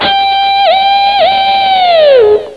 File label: guitar strat bend; Sound Effects for Multimedia - Volume 1